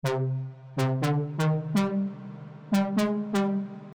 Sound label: Synths Spire Deep House